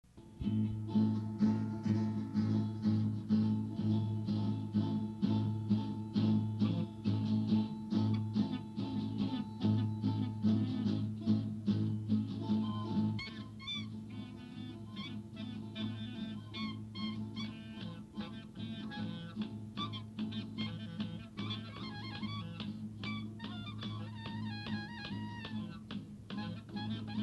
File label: Rhythmic Jam (meditation)